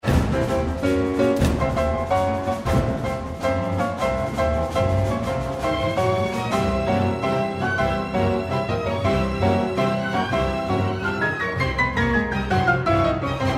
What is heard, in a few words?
Piano Con